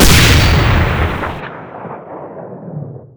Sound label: spring shoot